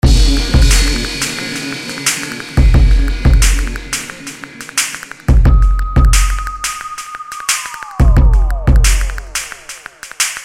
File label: BEAT (89bpm); SLING BLADE